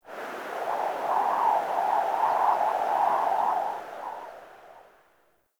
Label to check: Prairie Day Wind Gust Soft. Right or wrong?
Right